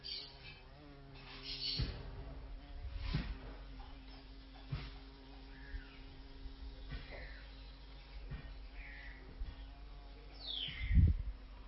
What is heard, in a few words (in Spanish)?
cucarron, insectos